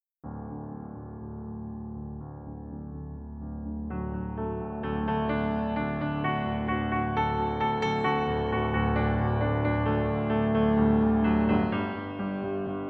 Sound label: Piano Solo